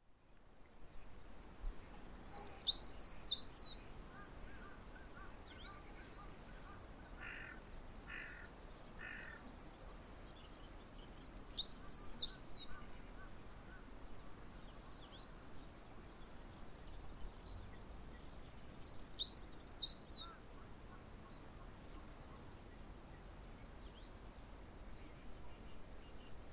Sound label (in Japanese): ホオジロ 6月 さえずり